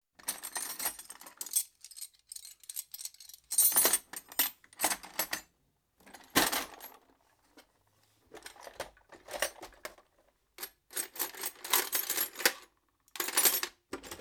Tools Removed From Box And Put On, Construction; BBC Sound Effects Library

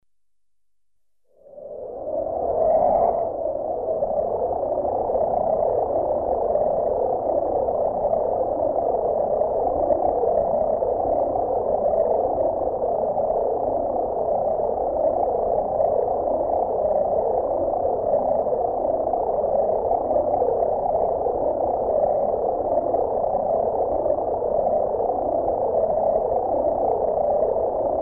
Pulsating pink noises; Sound Effects Library

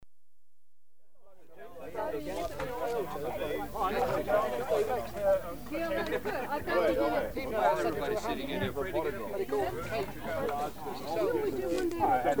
Crowd at Garden Party, Eunice, LA; America; The BBC Sound Effects Library

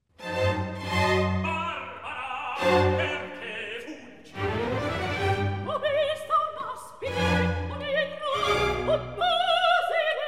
Recitativo; Barbara Perche fuggi; Mozart, Wolfang Amadeus; Cosi fan tutte

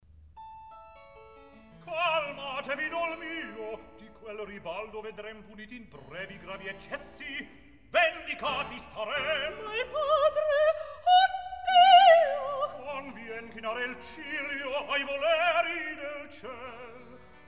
Recitativo; Calmatevi idol mio; Mozart, Wolfang Amadeus; Opéra - Don Giovanni

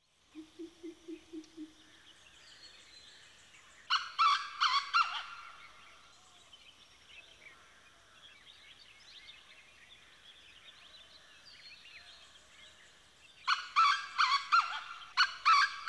Birds (Early Morning), India Pakistan Nepal; Sound Effects Library

